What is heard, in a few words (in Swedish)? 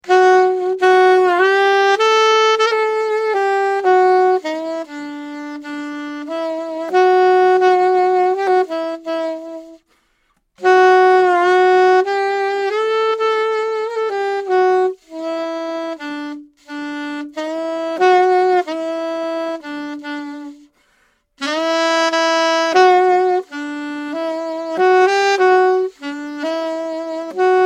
Saxofon; 16 bit